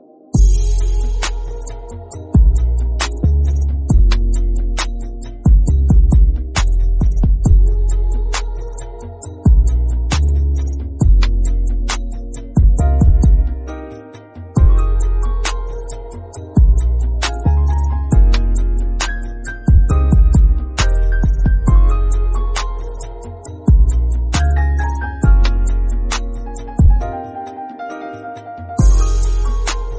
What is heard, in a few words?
Instrumental